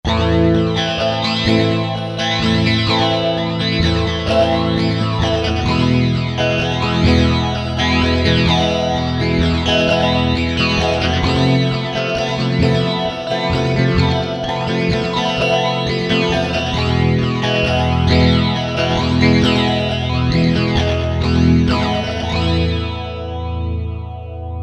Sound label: MULTI PHASER